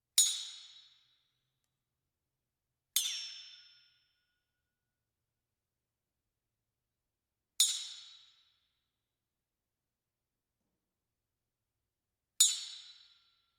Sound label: spring steel rico hit